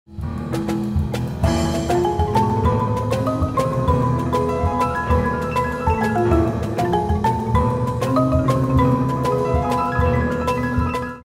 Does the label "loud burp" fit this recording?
No